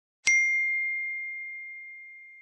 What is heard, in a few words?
notification tone sms tone